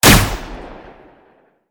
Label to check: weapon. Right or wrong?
Right